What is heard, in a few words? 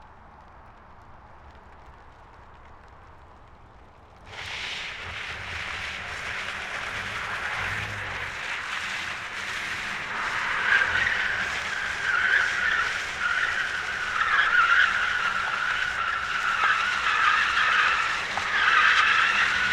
drift; Dolby Atmos